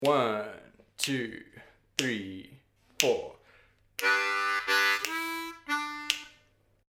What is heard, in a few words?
Chug Them Blues Licks Turnaround, harmonica